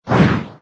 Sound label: attack